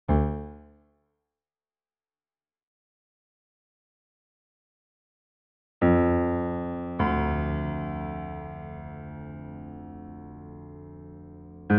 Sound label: Piano